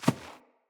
Fawn Step; Encounters; Animal Rescue

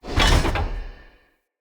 Weapon Rack Open; Weapon Racks

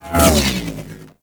Space Fighter Laser Fire Whiz By; Warframe; RailJack; Corpus; Space Fighter Laser Agent

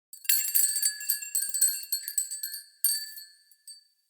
Tiny Bells B; Fey Hub

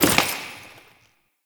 Follie Enemy Step; Warframe

